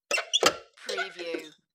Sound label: Turn Door Handle And Open Door; household sounds; doors windows locks; lynne-publishing